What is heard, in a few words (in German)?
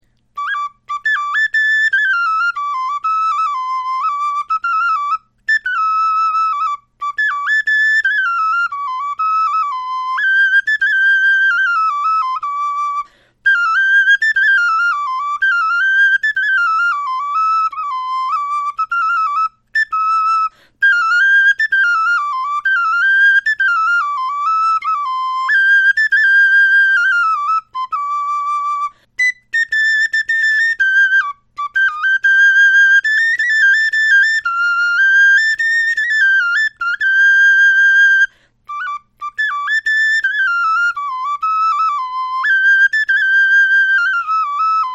melodie, knochenfloeten